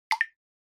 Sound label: Water Drop High Dry